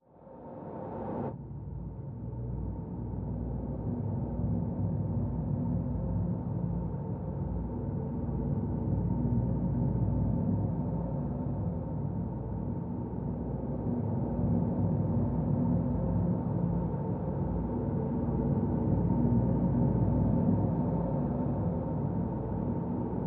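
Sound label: drone simple A; Drn Bleeding Out Simple A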